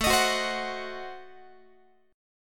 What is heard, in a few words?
A Diminished 7th A down Guitar; strummed; Adim7; x,17,18,16,16,17